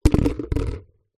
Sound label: household small plastic halloween tub down on wooden floor